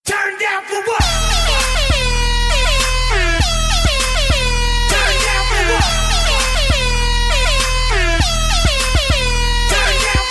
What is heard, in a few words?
horn; thug life